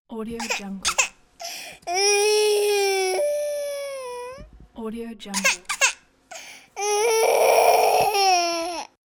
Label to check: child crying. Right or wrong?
Right